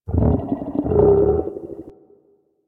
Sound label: Venus Landscape Mushroom Creak; Warframe; Venus Open World